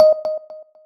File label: voice app stop listening start thinking; BlackBerry OS-10; voice app sounds